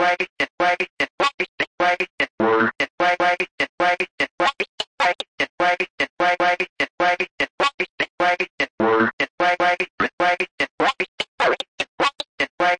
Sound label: 149 wasted vox; MELODIC LOOPS; VOCAL OR VOXY LOOPS